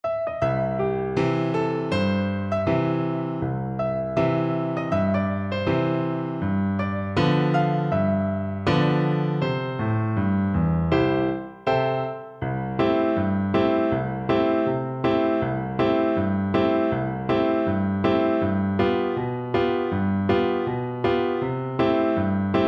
Alto Recorder

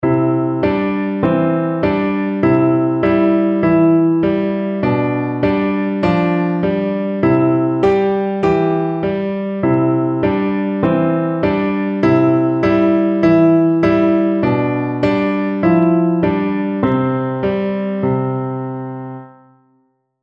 mary had a little lamb, piano

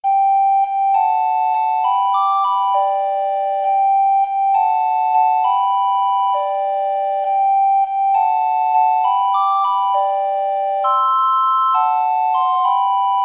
recorder duet

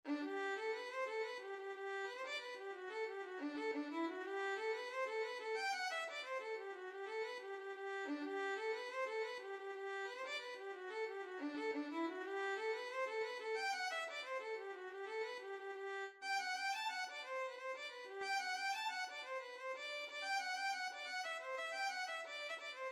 violin

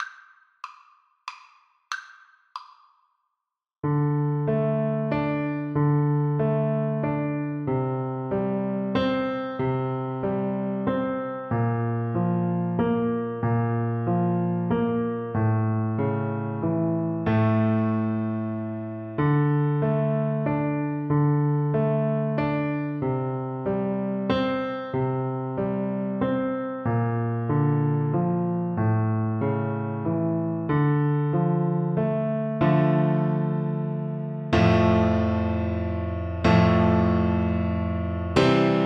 greensleeves kar, Flute

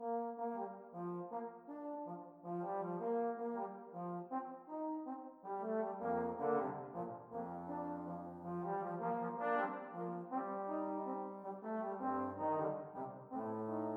salsa, brass quintet; jingle bells

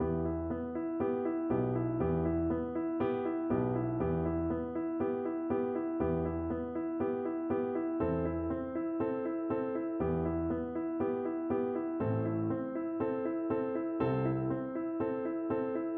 violin

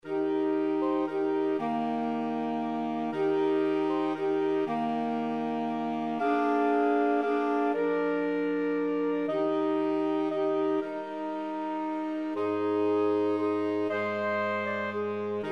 silent night, saxophone quartet

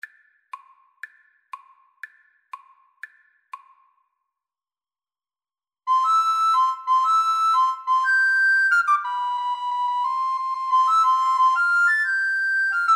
italian, Recorder Duet

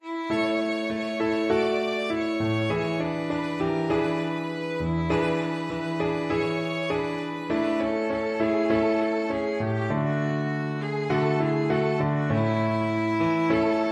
we shall not violin

